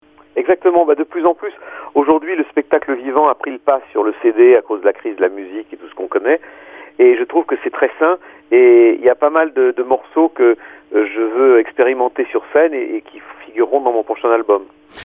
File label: jmjazz; Детско БНР